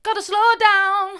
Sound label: gotta slow down mid, vocal